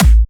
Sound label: Trance Kicks